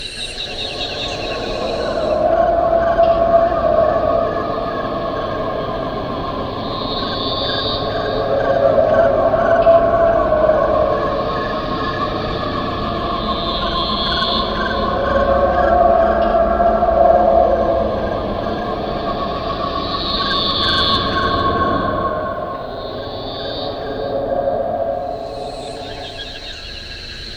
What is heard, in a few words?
Ruins Ambient